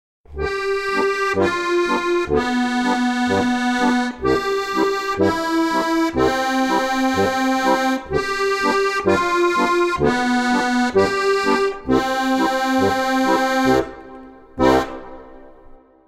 harmonika

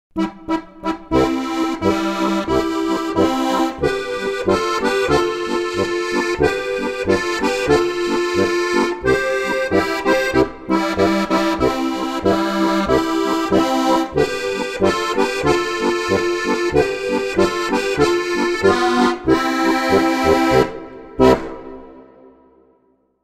harmonika

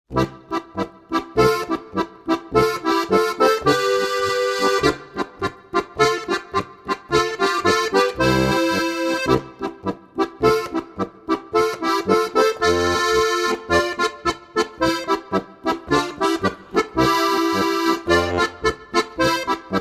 Polka

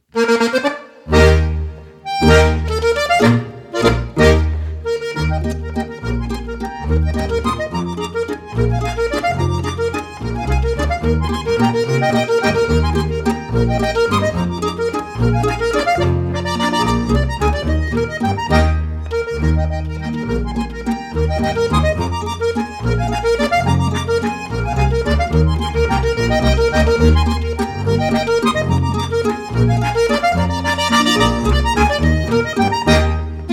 Landler

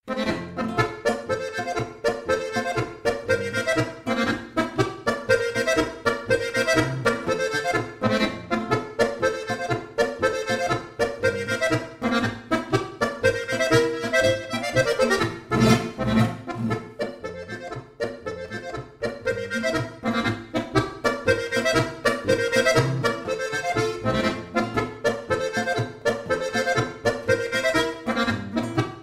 volksmusikstueckl

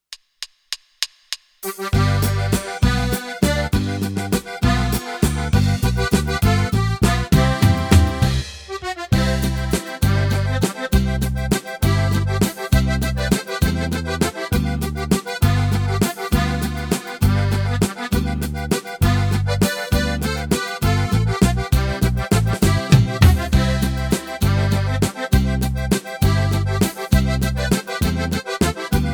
Hopsasa mit Melodie

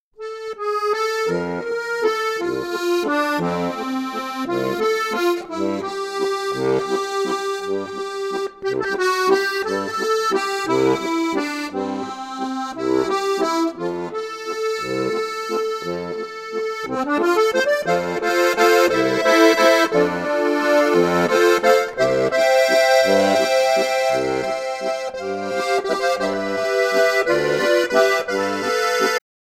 Walzer

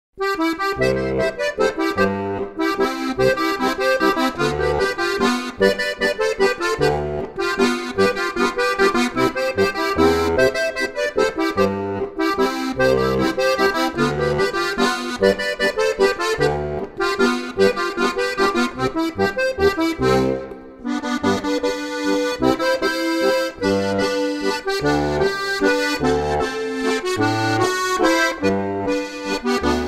laendler

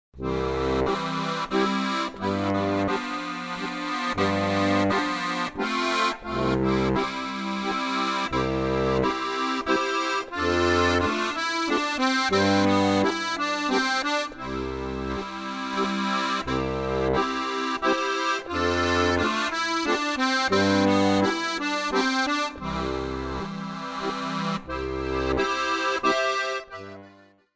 Menuett

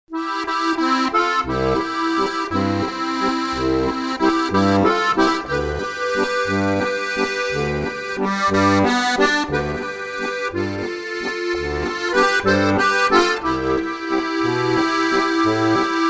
Walzer

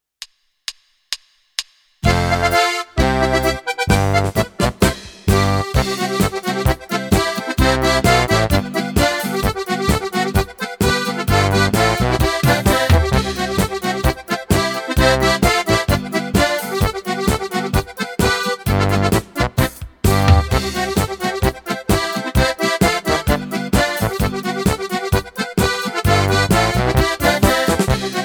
Marsch der freiwilligen Feuerwehr mit Melodie

